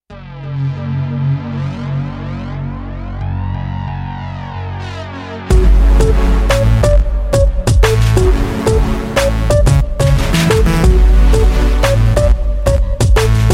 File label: Fall Over Pick Up Step Music